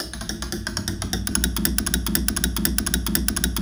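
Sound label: 120 BPM; RHYTHMLOOPS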